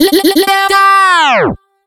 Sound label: filler tool 128 G; Electro; filler tools; Anthemic